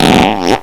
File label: fart